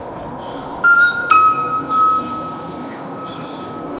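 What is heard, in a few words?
distant city park ambience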